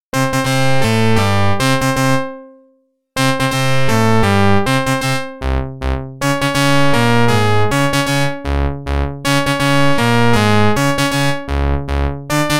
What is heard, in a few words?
Organ; NHL 94